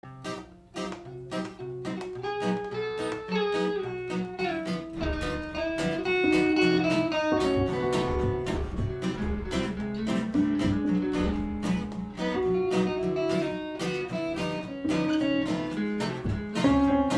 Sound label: acoustic bounce